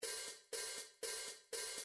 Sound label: rock cymbals 1 bar; Rock Rock 3 Cymbals 1bar B